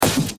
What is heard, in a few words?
Snare